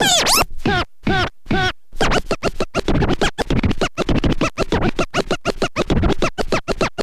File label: scratch med, Vinyl Attack scratches mix